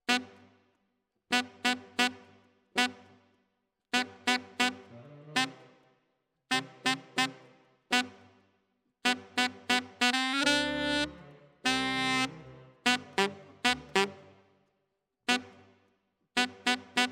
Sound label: Alto, RNR for piano